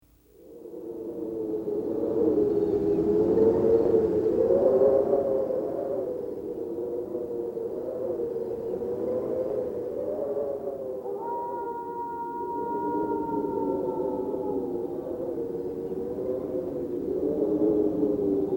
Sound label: Ice Mountain Ambience; starfox